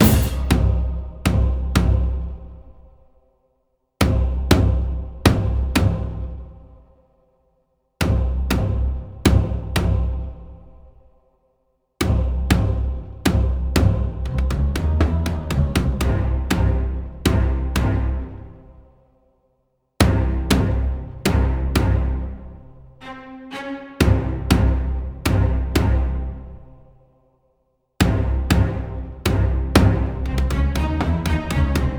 Lava Level loop; Rezrog; Depard; Gems of Rezrog

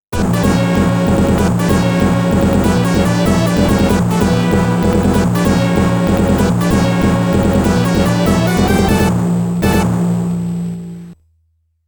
Area 1 Clear; SNK Arcade Sound Digital Collection Vol. 24

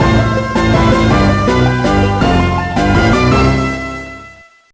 Mission Completed; CT Special Forces 2: Back in the Trenches; GBA

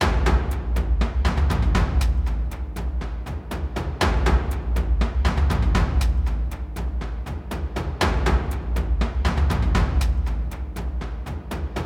Perc hard; raji an ancient epic

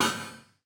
metal hit; teardown ps5 windows xbox series xs gamerip 2022

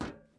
metal step; teardown; ps5 windows xbox series xs

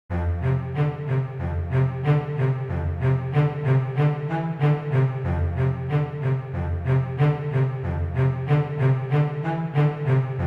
Old cello; Tracks Toybox Edition

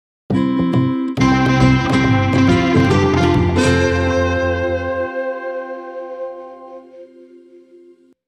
Power Activation; Advance Wars 1+2 Re-Boot Camp

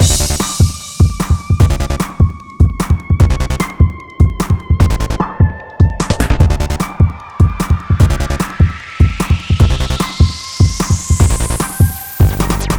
game music fast

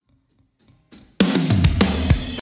BGM Mine Intro; Fallen Knight

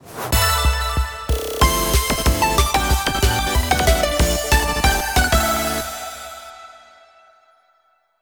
Stage Clear!

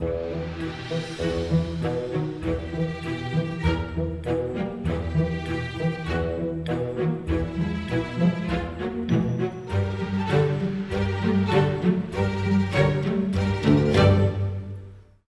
sting burglar arrive; The Sims 3; windows gamerip 2009

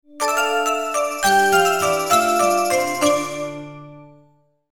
Visiting A Faerie Ring; Heroes of Might and Magic: A Strategic Quest; 3DO; MS-DOS

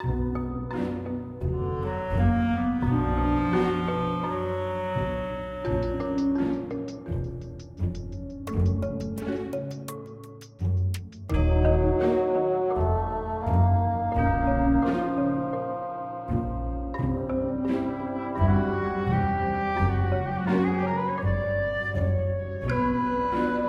Ghost Ship; Scooby-Doo Looney Tunes Cartoon Universe Adventure; 3DS

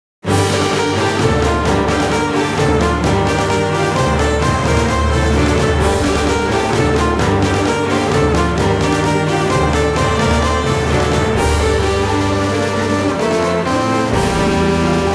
National Anthem; Advance Wars 1+2 Re-Boot Camp; Green Earth

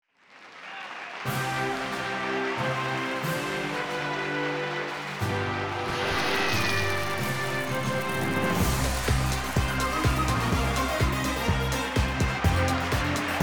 Intro Fanfare; Buzz! Brain of the UK; PS2

